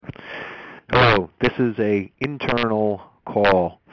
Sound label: Fuzzy